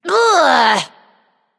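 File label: ugh, female; smite; voicepack; hera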